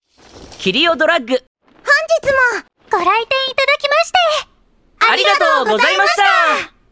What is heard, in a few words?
applause; osu